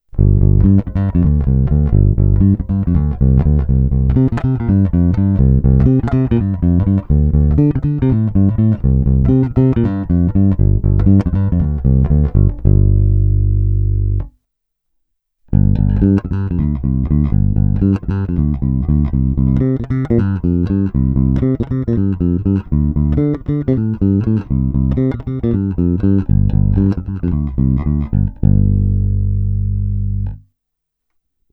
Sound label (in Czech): Ukázka 2 (RW), Mike Lull V4, baskytara; Darkglass Harmonic Booster, TC Electronic SpetraComp